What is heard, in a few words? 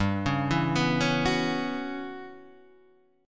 E Minor 6th Add 9th G chord